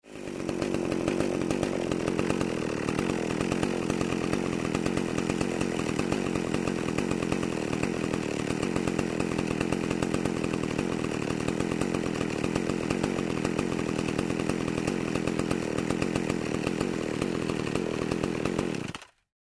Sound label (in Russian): benzopila holostoy